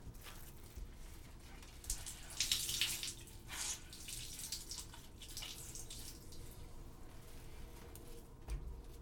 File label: Water Squeezing